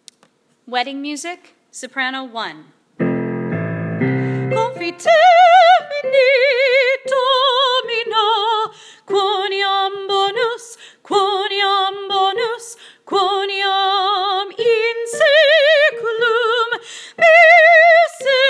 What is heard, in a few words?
wedding soprano